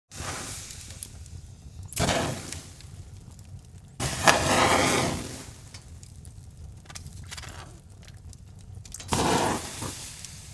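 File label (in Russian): Льют воду в костёр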